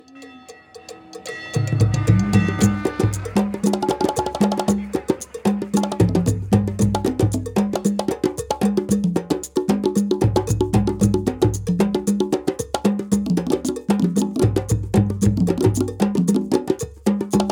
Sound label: percussion group